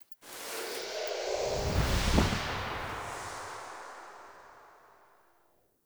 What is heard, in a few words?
Tent Night Fold Transition Enter; Soul Plane